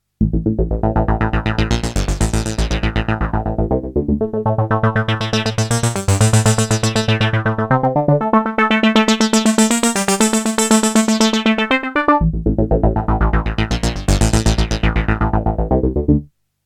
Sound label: synth